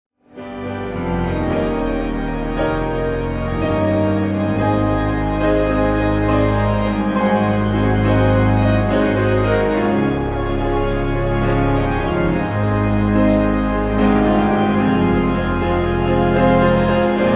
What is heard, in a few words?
Organ Piano Prelude; Night Of Song 2003